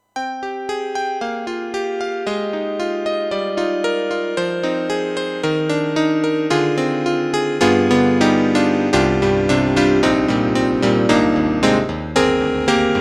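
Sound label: YAMAHA MU500 Electric Grand Piano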